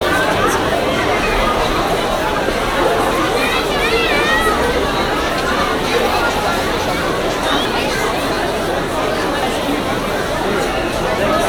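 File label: crowd family, crowds interior; best service Blue Box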